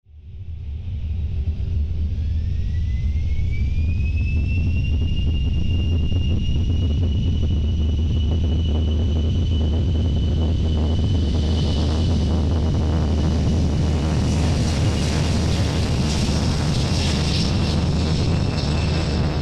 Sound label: jet take off